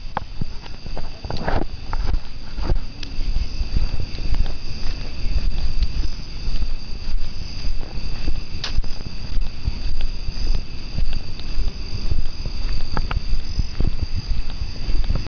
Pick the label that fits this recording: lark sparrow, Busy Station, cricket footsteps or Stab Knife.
cricket footsteps